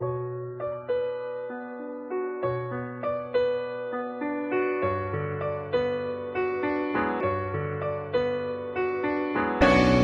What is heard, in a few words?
piano and guitar, sad loops